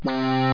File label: wall Scratch; BeamWars™ 2.3.1